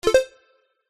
multimedia game sound synth digital three tone beep